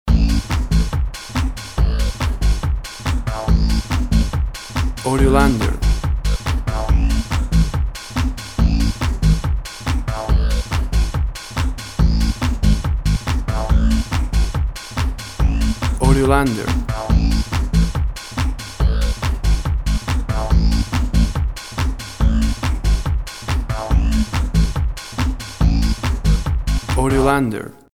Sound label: 1990s House